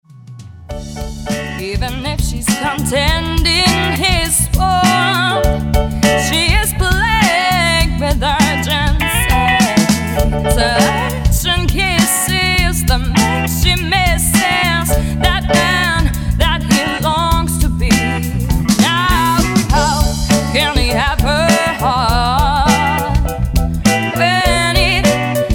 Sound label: Doo Woop